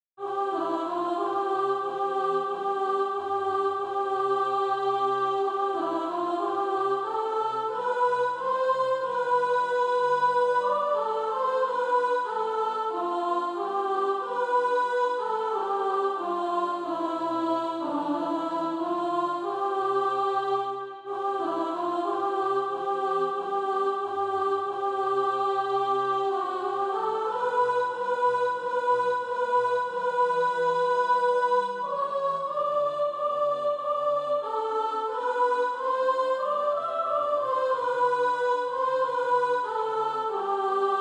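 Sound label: sop, Practise Music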